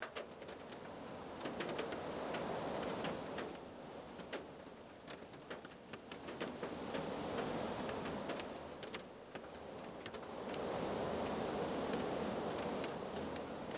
SNOW OUT, WEATHER; SIMUZENSOUND; WEATHER SIMUMAK

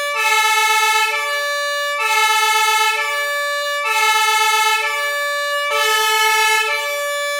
FIRE SIREN; SIMUZENSOUND; BOUNCE

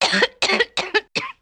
COUGH WOMAN; SIMUZENSOUND

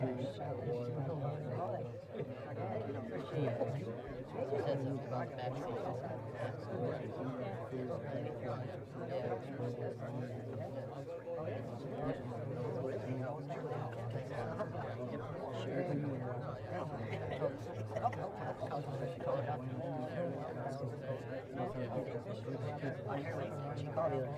CROWD BUS IN LOW; SIMUZENSOUND

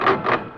GEAR GRIND; SIMUZENSOUND

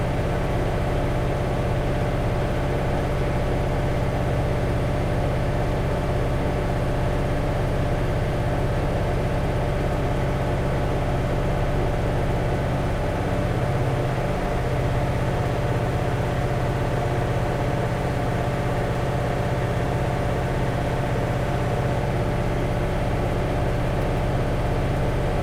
DRIVE CABIN; SIMUZENSOUND

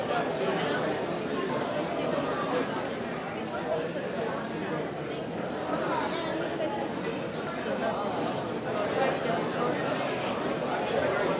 CROWD BUS IN HIGH; SIMUZENSOUND

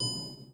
speed limit warning; SIMUZENSOUND

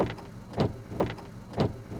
Wiper Washer; SIMUZENSOUND

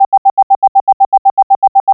PARK SENSOR; SIMUZENSOUND

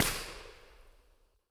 Forest, Hybrid Reverb; iZotope Hybrid Reverb

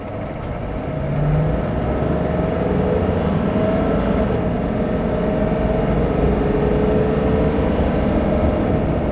MOTOR REV 4X4; SIMUZENSOUND

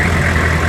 MOTOR IN; SIMUZENSOUND

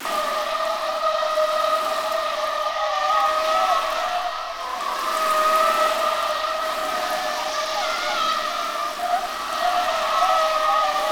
SKID WET; SIMUZENSOUND

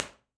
Small Wood Room, Reverb; iZotope Hybrid Reverb

